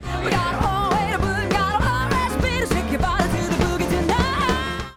Automation Level Inconsistency Automated, Mixing Lead Vocals